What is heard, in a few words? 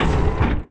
Move floor up; Tds Sfx Movefloorup